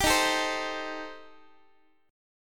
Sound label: D sharp 6th Flat 5th, D sharp, down, Guitar; D#6b5 strummed; x,x,10,13,13,11